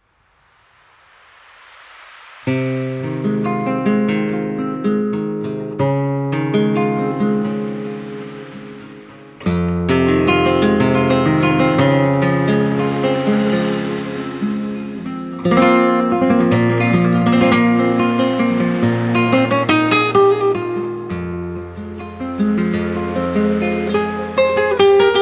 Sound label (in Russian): krasivaya melodiya, zvuki prirodi